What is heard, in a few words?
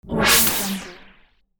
abstract noise woosh